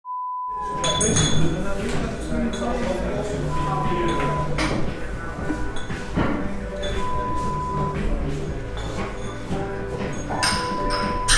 Restaurant sound

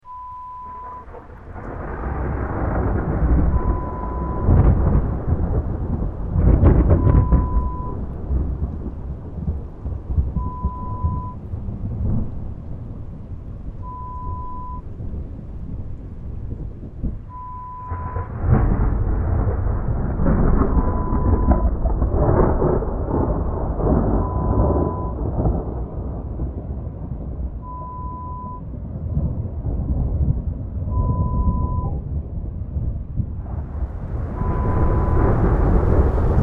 Rolling thunder

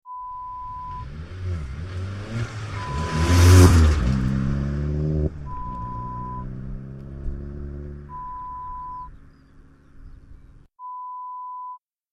Car Drive by

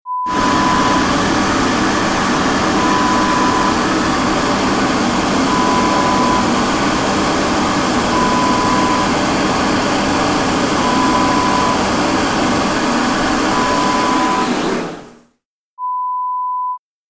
Hand Dryer sound

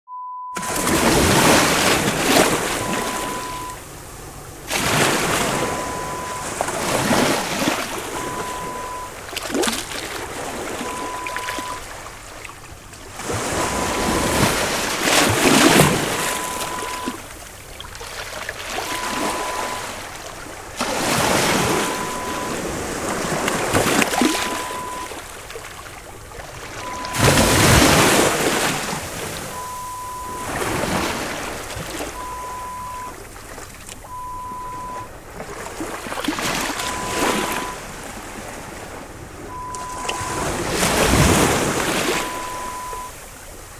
Ocean Wave sounds

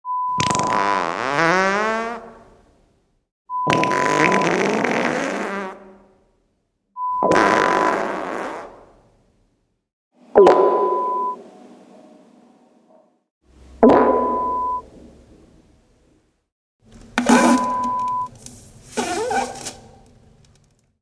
Big fart collection

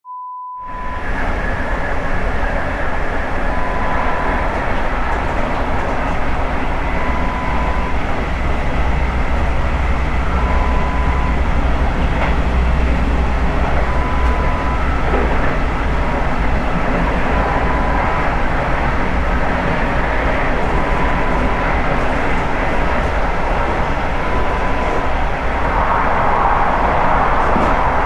train internal sound

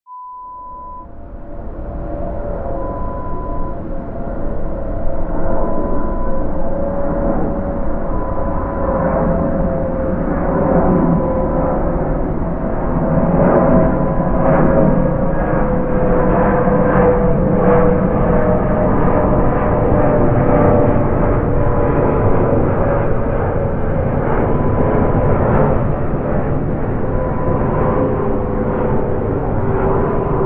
Aeroplane Flyover

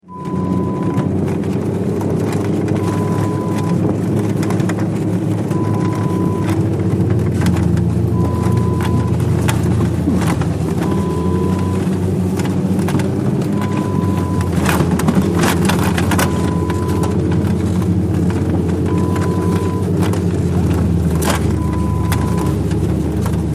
Skidoo

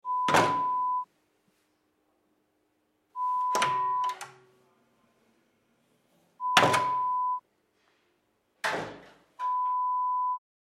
Door Slam sound

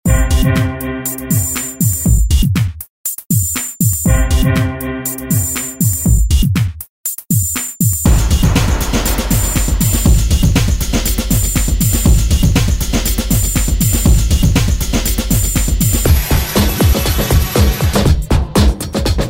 beats

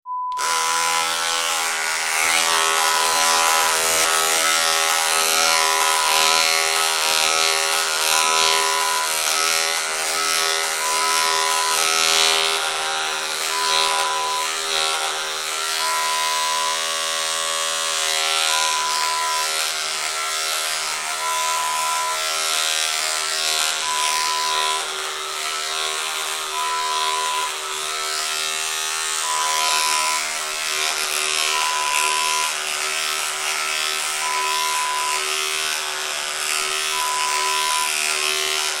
Hair Clippers sound

